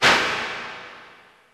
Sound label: IND; Zero G; Man Machine